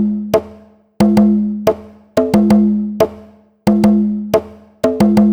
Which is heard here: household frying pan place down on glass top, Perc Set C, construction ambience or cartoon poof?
Perc Set C